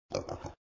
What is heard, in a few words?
Boar Sound; moonstone madness